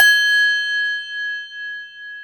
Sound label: BELL G#, TOY HANDBELL; AKAI S-Series CD-ROM Sound Library VOL-7